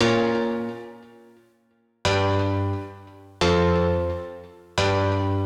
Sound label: Taped 88BPM A# min, pianos; 99Sounds; Monosounds; Music Loops; Instrument Loops